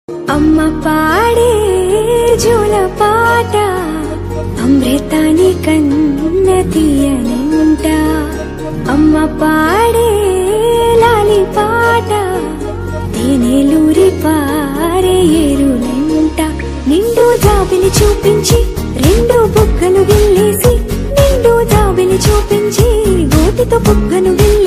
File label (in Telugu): amma paata ringtone amma songs telugu village folk; mittapalli studio